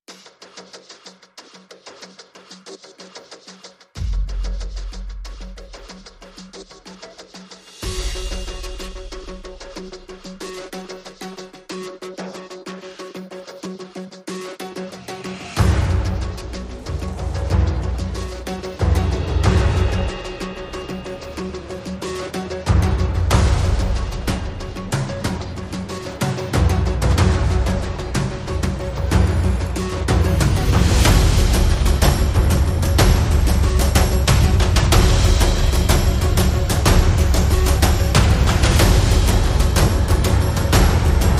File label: Perc and Pulses Only